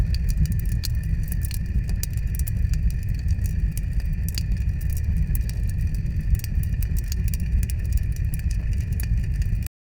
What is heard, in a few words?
create a seamless, tavern